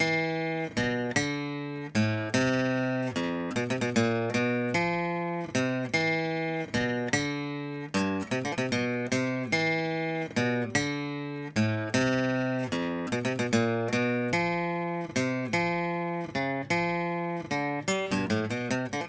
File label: guitar; Audio Analogies